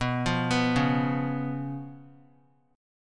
B Suspended 2nd Flat 5th B; arpeggiated; JGuitar

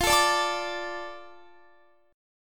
F 6th F down Guitar; F6 strummed

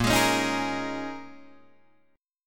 Bb Minor 11th Bb down Guitar DADGAD

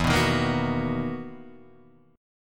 B Major 7th E; BM7/E Chord {0 2 1 3 0 2} strummed

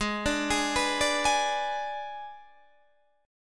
G sharp Diminished G sharp, Guitar Open D; 18,17,18,17,17,18

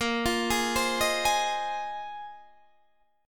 A sharp 9th Suspended 4th A sharp, Guitar, Open D

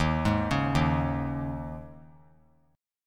Eb 7th Suspended 4th; Eb7sus4; arpeggiated; JGuitar

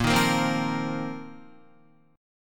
Bb Suspended 2nd Suspended 4th, Guitar; strummed

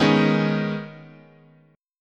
Eb 7th Suspended 4th Sharp 5th, chord

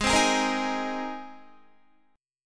Ab Major Add 9th Ab down Guitar; Abadd9 strummed; JGuitar

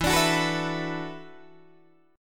E 7th Suspended 2nd Suspended 4th, Guitar, DADGAD

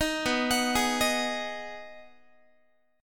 B 6th B down Guitar 4A 4E 5A 5D 5G sharp 6C sharp; arpeggiated

